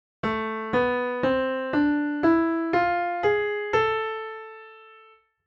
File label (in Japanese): ハーモニックマイナースケール, piano